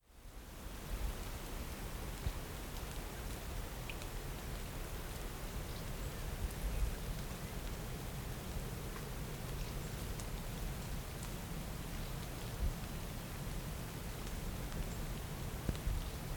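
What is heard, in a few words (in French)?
Pluie, Paysage sonore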